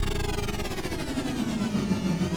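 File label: speed down, Shuttle; spped down 2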